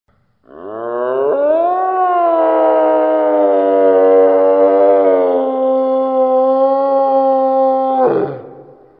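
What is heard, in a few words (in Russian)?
los voet, moose howling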